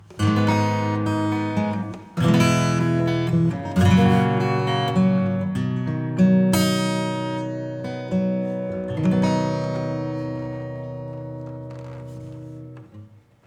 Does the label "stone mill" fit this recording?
No